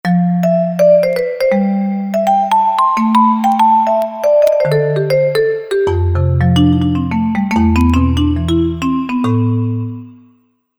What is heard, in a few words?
2x4 Marimba